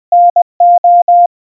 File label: NO, -. ---, Морзе; Отрицательно; Генератор азбуки Морзе